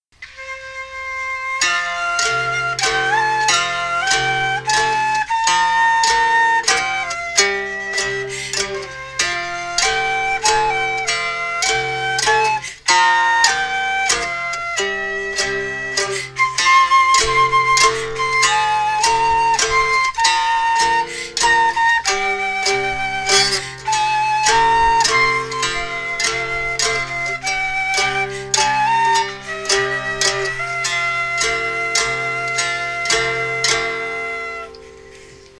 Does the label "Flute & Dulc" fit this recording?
Yes